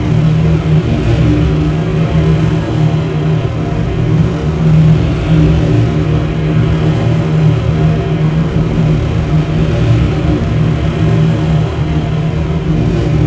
Spectral Groan; Sonic Foundry (Sony Creative Software); Loop Noir

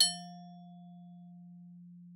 TRIS F3, VIBES; Xsample VOL-4

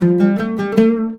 LUTH D, Arabian Traditions, LUTH RIFF; USB Soundscan vol.43; AKAI

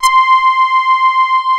SAW LEAD C5, PPG, analog; USB Soundscan vol.49; Analog Fantasies; AKAI

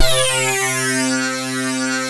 LEAD C3, Analog; USB Soundscan vol.49; AKAI; ARP

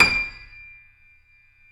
KEY, YC7 Piano pp

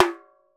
TAMBORIM, Brazil Drum Perc; E-MU Producer Series Vol. 7 – Old World Instruments

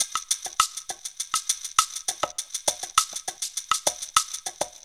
PERC LP, PERC LOOPS; Zero-G; Funky Elements

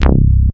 C2, fat bass; Best Service Dream Experience; FAT TG BASS

